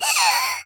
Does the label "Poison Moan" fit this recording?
Yes